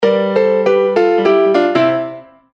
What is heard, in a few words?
Piano Solo; rocktopus